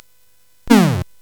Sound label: Dark Castle, CSG8580R5